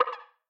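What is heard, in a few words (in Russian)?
звук удара в игре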